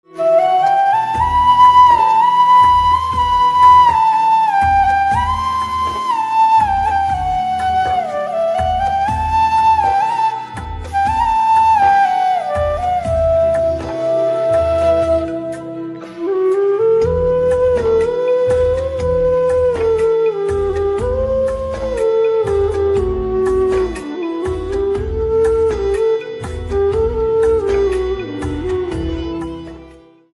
Flute Instrumental Ringtone

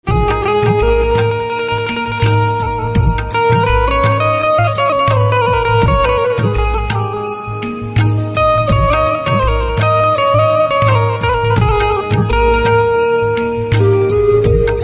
Banjo Instrumental Ringtone; devotional instrumental ringtone

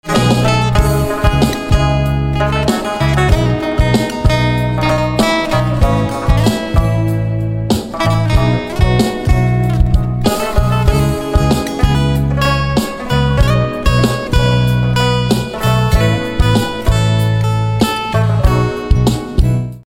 Guitar Instrumental Ringtone

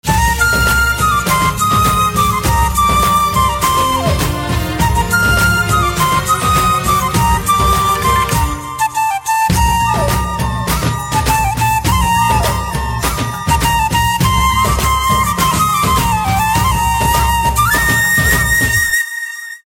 Flute Instrumental Ringtone

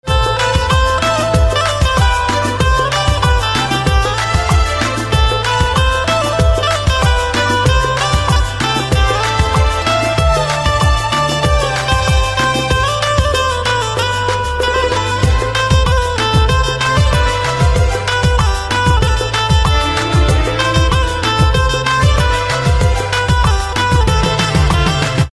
Banjo Instrumental Ringtone

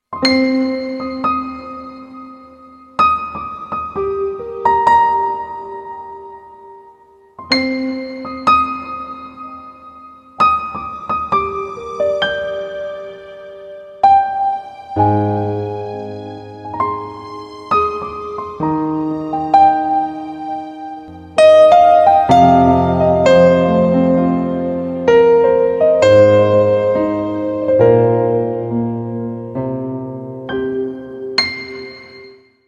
Guitar Instrumental Ringtone; alarm tone

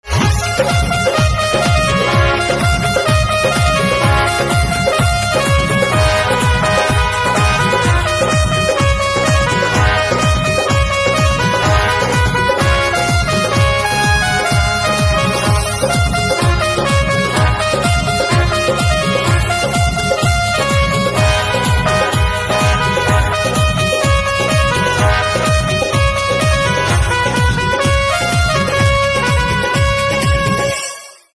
Saxophone Instrumental Ringtone

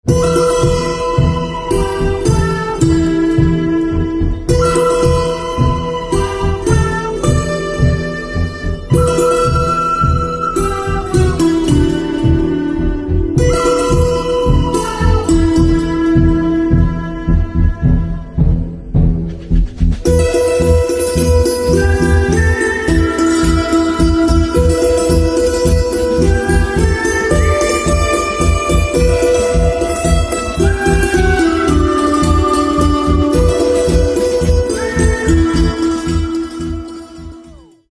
BGM Santoor

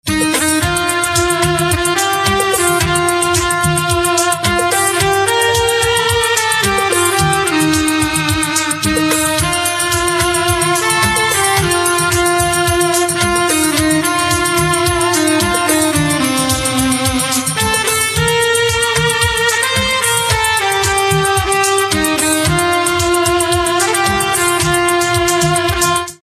Saxophone Instrumental Ringtone

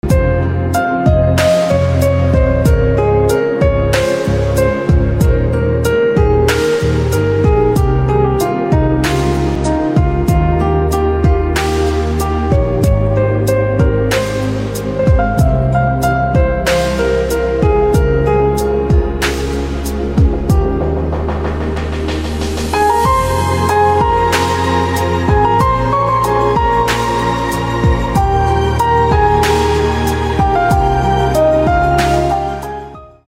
Piano Instrumental Ringtone

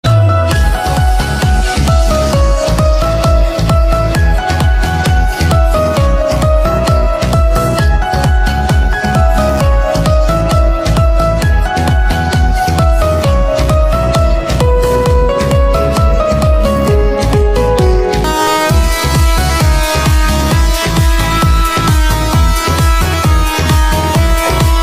Piano Instrumental Ringtone

